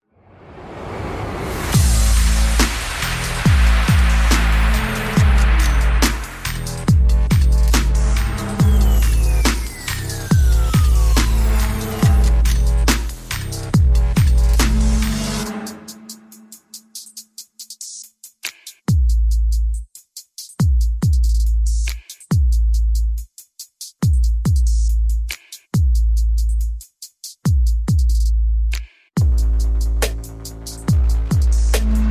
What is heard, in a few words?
Percussion Bass and Effects